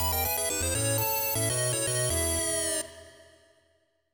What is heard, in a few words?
Smart Ball Losing Jingle; tokimeki memorial 3 yakusoku no ano basho de; ps2